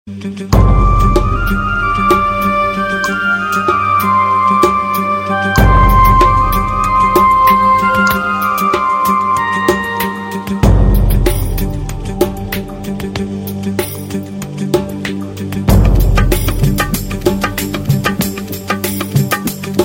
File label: Taal Music